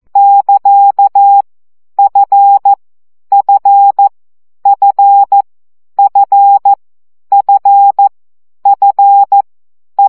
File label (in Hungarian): CW gyakorlas f 12 baud